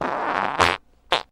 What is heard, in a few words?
fart bursting; Farts